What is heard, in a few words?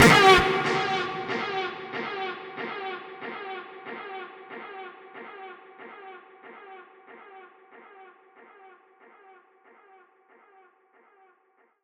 Voice Tweak F# 140 BPM, psytrance; Voices; Tweaks; Ghosthack; Psytrance Visions Volume 2